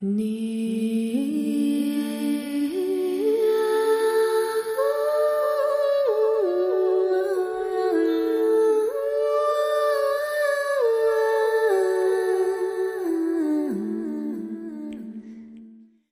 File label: Vocal Only